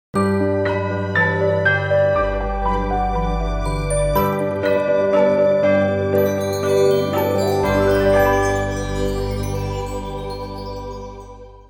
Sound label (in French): Noel link, jingle; Jingles medley